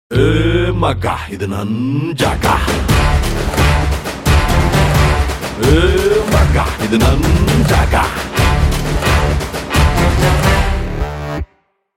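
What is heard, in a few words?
Kannada Promo background music Ringtone; Pushpa The Rise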